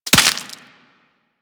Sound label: Headshot Impact Flesh; Warframe; Weapons; Bullet Impacts